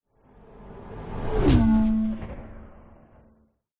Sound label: sound design whoosh sci fi pass hit; zapsplat